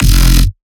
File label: Bass Shot F; Ghosthack; Free Trap and Dubstep Samples 2018; Bass One Shots; Mammoth